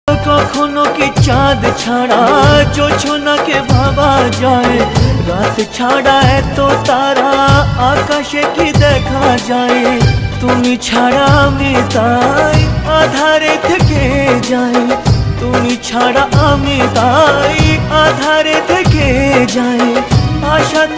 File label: bengali ringtone